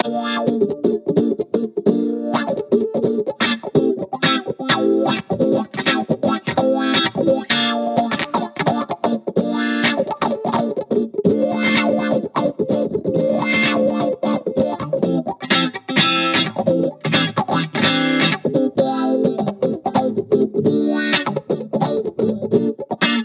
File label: 128 Guitar Loops WAH; random and out of place